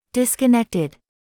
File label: disconnected; QuantumTerminal